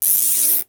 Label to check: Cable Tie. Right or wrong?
Right